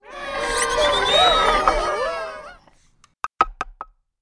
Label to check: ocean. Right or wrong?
Wrong